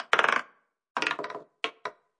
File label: ball bounce, roulette